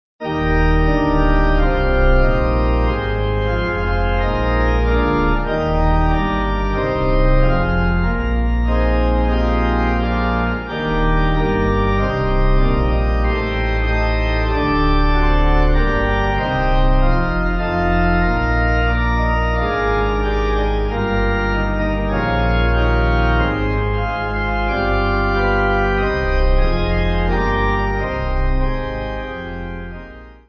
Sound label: Pipe